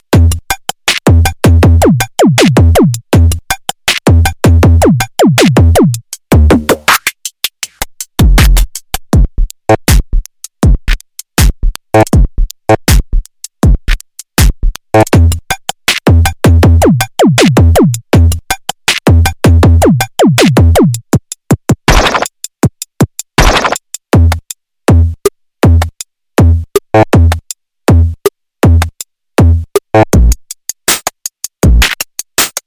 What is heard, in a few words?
jungle drums; weeklybeats-2016